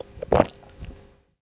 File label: Drink; ゆめ2っき